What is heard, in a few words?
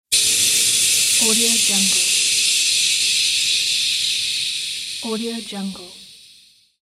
steam release